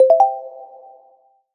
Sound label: notification